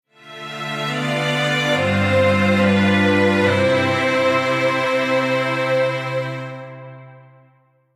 Strings Poly; Hamo

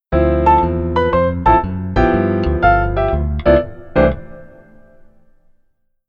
Keyboard Timing

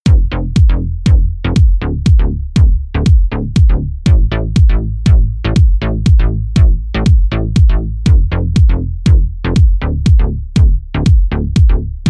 Synth Bass Bass Plus

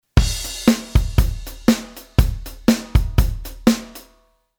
Midi Basic Quantize

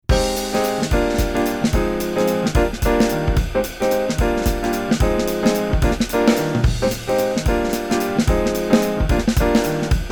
Jazz Waltz Chord

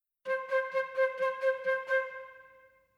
stacc

